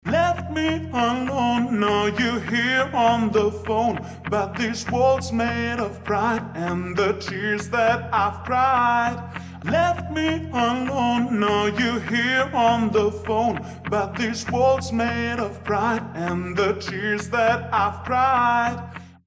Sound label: Vocal